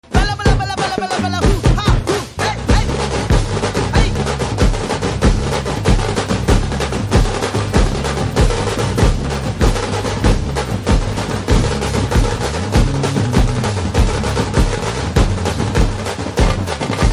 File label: BGM Drum Beats Ringtone; Telugu; from Nani's Dasara Movie